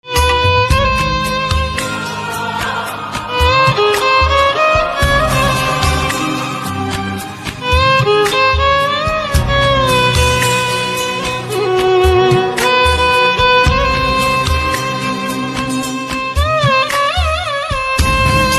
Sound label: Violin Instrumental Ringtone